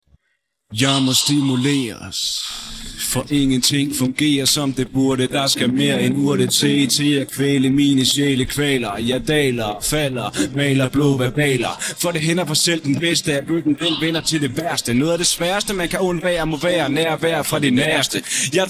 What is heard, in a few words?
vocals